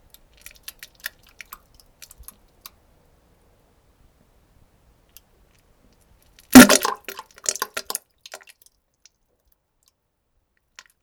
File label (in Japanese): もう少しなんべんになってきた：むちち ち ち ち ち ぶびっ（ぼちゃちゃ ちゃ）ぶり（ちゃ ちゃ） （ち）